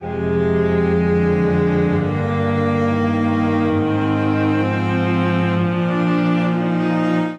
Progression Continual i-ii-III-IV F Minor 130 bpm, String Quartet; MIDI Clips; Ableton; Factory Packs; Spitfire Audio